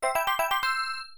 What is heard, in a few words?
Coin; Salamander